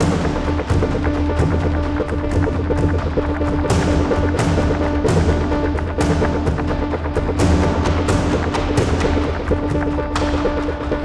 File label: Valley Music Jet Fight; Battlefield 3